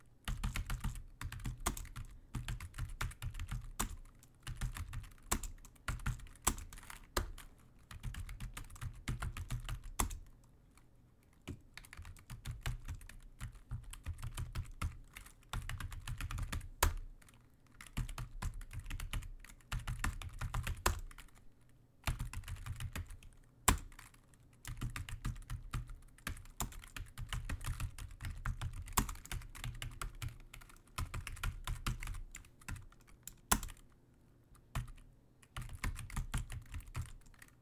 Cheap Keyboard Typing

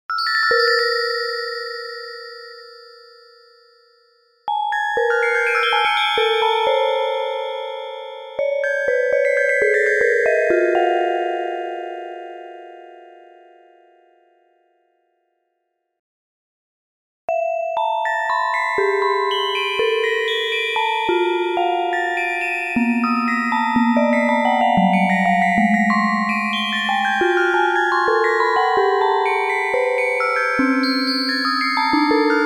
Xylo

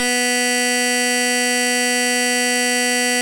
70s Office Call

